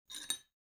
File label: coffee spoon; drinks